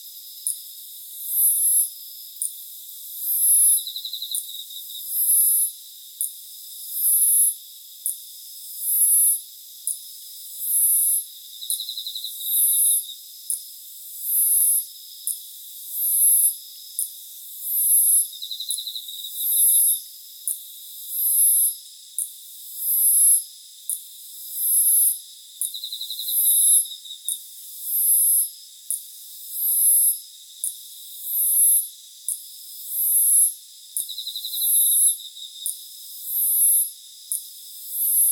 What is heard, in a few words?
Hexacentrus hareyamai Teleogryllus emma